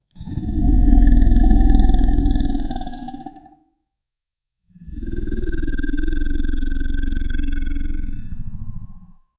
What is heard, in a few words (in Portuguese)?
efeito sonoro de um drago, dragon